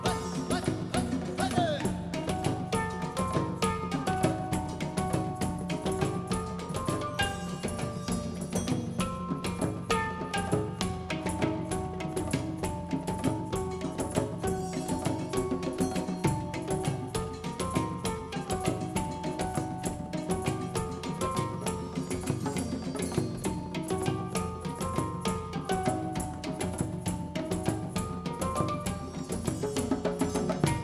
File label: drum Song; Arabic; World Music